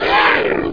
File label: HURT